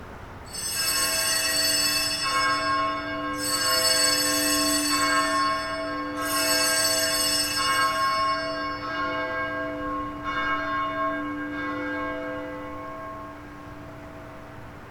bells

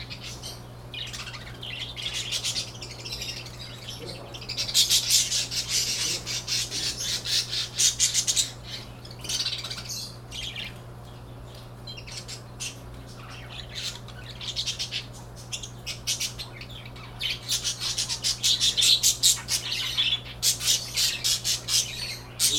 cameo birds, pet shop

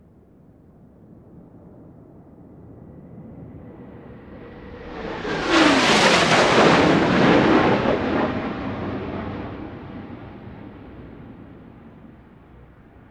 jetpass

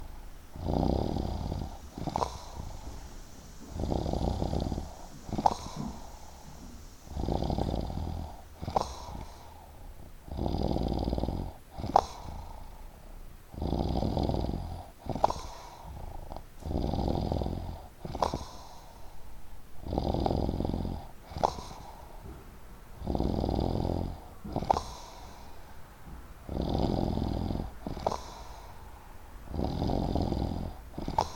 snore

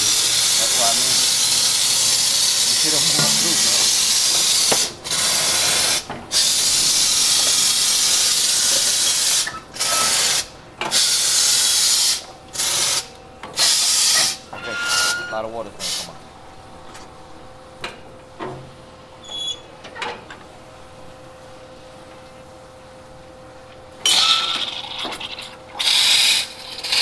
machine, seltzer man

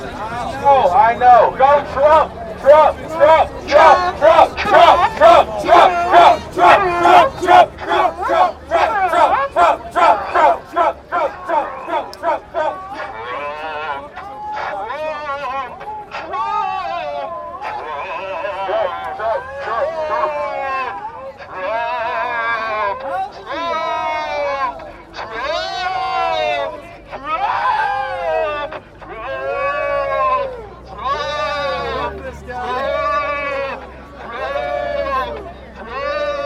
cheer; alt right trump cheer call; alt-right performance art

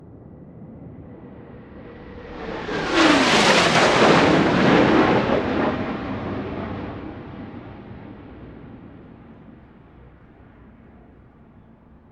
jet pass

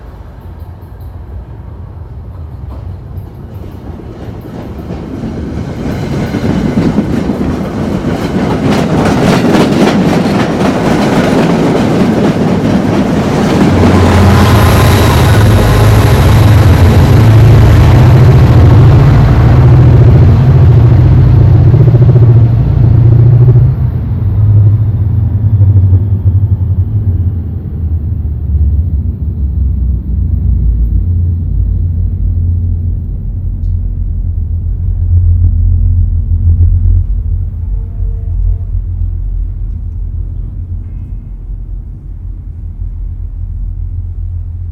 bergen west growl; the bergen tunnels

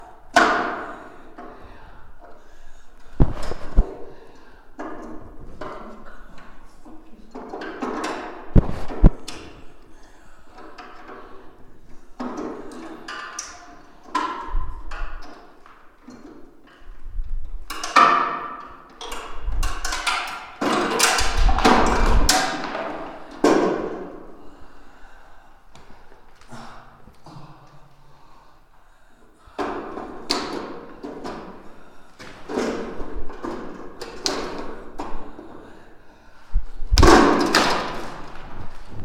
BOOGER BREATH POUND; booger scrapping

